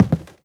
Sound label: step, footsteps cardboard